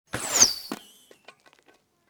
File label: Whoosh-Bow Arrow Shoot, Magical, Surreal, Science Fict; Electa Quinney